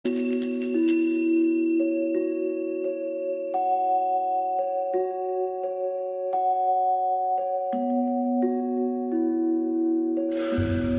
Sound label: metal intro, feng shui 5 element